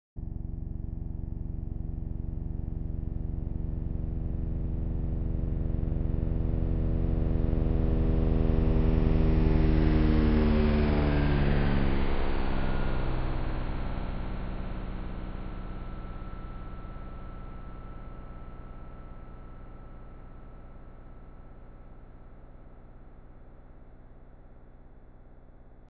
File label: auralized Flyover; 30 Equal Angle Sections